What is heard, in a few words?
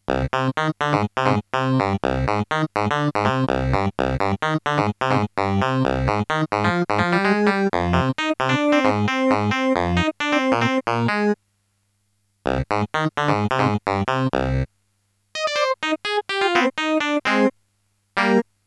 Casio MT 400V Funny Tone Cosmic